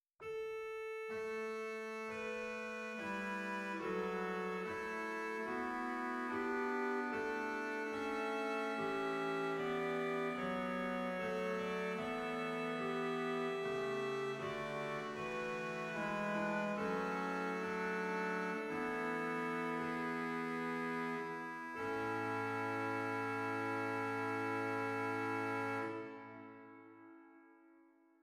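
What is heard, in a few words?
Borstwerk Dulciaan 8, organ; zwolle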